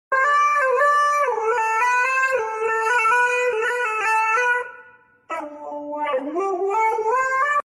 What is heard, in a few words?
Autotune Dog